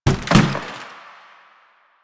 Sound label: Janitor Cart Impact Short; Warframe; Ambience; Nineteen Ninety Nine